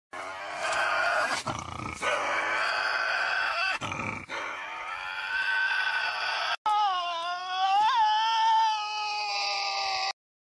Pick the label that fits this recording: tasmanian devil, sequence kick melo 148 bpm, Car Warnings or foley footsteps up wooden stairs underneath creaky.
tasmanian devil